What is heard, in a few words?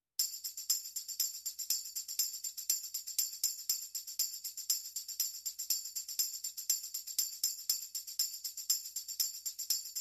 Perc Only